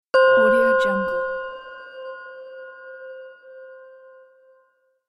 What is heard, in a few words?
bells sound; ib music